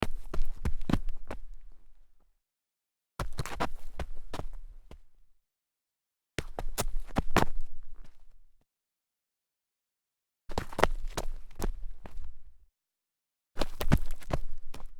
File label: sneaker stumble forward dirt, foley